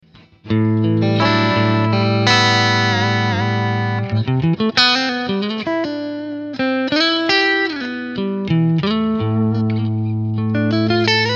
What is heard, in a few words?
Clean Front HB und SC